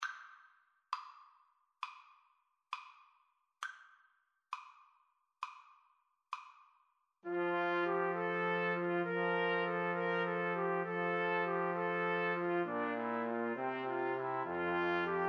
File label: clarinet 2 Trumpets Trombone; pastorale